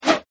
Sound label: attack; kudabot